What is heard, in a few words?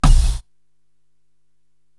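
bass drum Industrial Air, Korg TR-Rack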